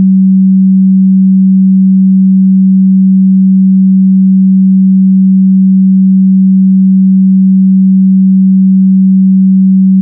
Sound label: beats; KundtTube